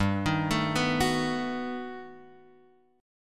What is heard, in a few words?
G Augmented Major 9th, chord; G+M9 Chord {3 X 1 2 0 2} arpeggiated